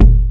Disco Kick; RnB

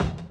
Percussion, Hip-Hop

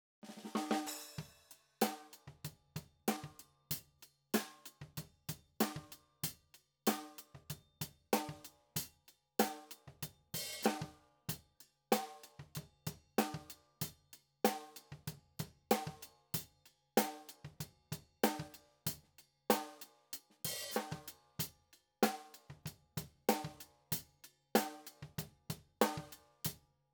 HI-HAT

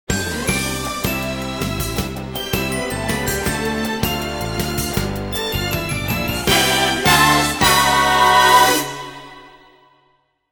Eyecatch Music; SailorMoon

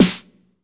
Snare; Takebeat; Club; VEC1

